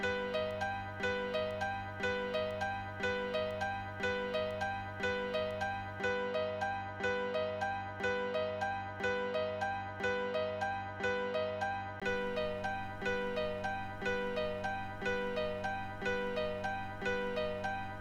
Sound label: zanzibar piano